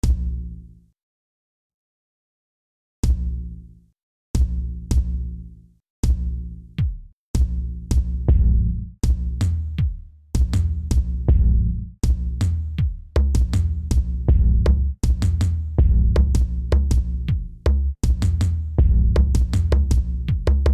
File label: Drums; Icarus